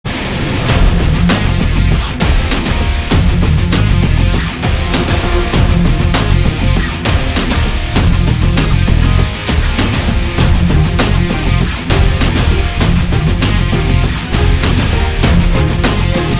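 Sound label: Interval Block background music Ringtone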